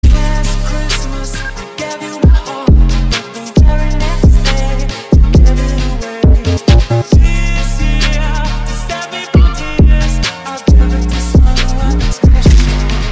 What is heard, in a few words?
drill remix